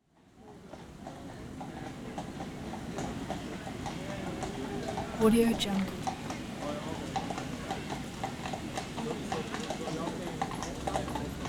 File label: horse drawn carriage pass by with people; nature sounds